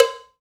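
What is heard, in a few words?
percussion cow, cowbells; Roland L-CD701; PRC Latin 1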